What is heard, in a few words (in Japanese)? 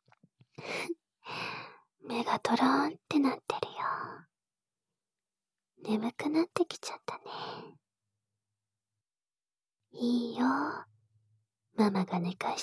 深呼吸、吐息ループ; 導入短